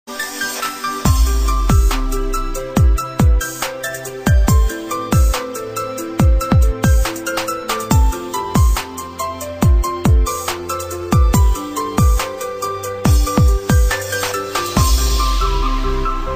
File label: Beat